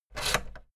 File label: fader plastic scrape double down fast